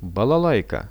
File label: balalaika; балалайка; Russian; music; RUSSIAN VISUAL DICTIONARY